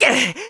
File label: Tornado Thrown; thrown from his Loftwing by the black tornado; Link; Skyward Sword; zelda